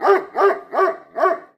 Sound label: pseudodog idle